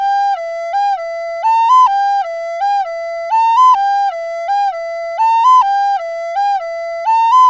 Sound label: EDM Flute Loop 128 BPM C; Gully Loops; flute melody bundle